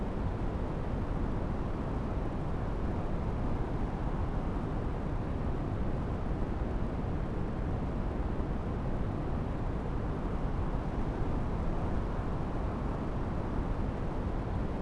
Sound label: theed Waterfall ambience; Star Wars Obi Wan; XBOX